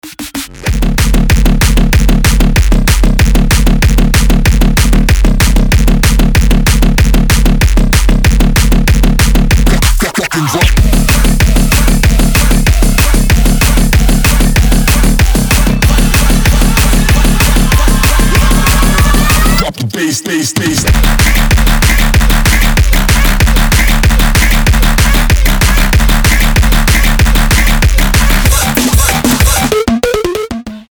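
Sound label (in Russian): samyi bass, the most bass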